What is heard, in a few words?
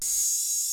DRUMMM FREAK, DRUMS + PERC; Rubber Chicken Software Sample Bank